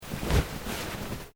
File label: Clothing Kimono Sleeve Move; Movemement sounds for various articles of clothing; Dressing; Human